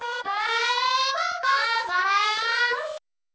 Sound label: Children Singing